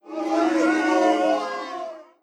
Boo

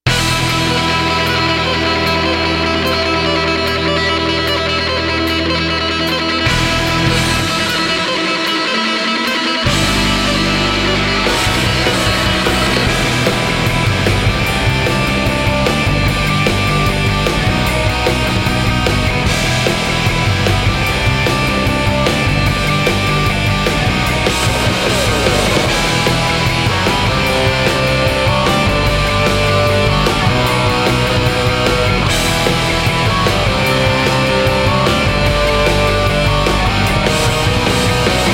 Rock

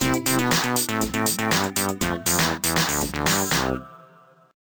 Transition

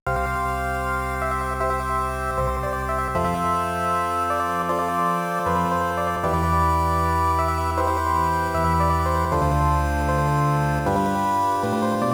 Ending; Drakkhen; Amiga; Atari ST; FM Towns; MS-DOS; PC98; SNES; X68000

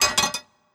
Grenadier Metal

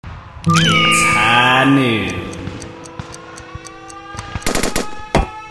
Revolver

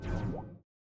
Teleport In

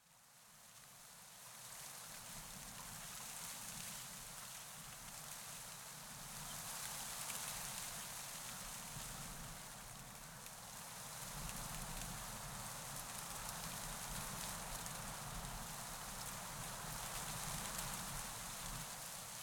Wind Through Trees; Wind Through Trees Am Jo

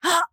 Ruins Flying Sentry Attack

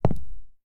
Footsteps Concrete Shoes; FX Oneshot

